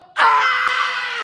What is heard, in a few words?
scream